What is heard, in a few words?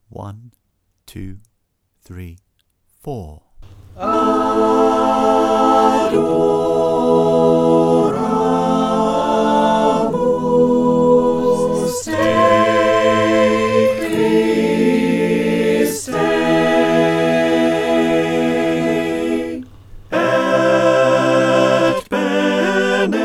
Adoramus Te SATB